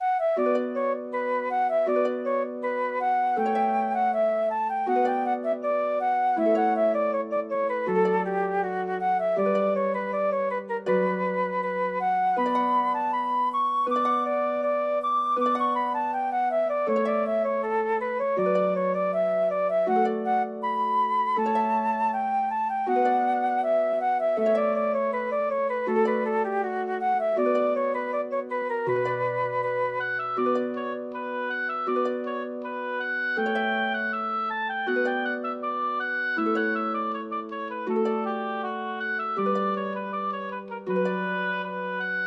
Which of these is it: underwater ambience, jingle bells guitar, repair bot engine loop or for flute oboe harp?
for flute oboe harp